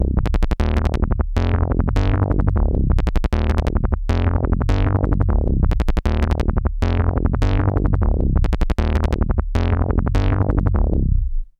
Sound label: SYNTHIX BASS, BASSLINES 175; Masterbits; Tekkno Trax